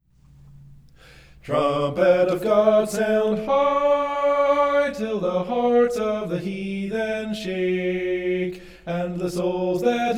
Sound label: Trumpet of God Sound High, hymn recordings; reforming the church's song